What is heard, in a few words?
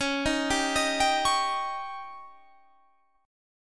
Db Suspended 2nd Flat 5th, Guitar Standard; arpeggiated; JGuitar